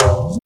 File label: TOM UP